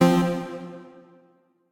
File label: Tessellation Clear C0; Mixolumia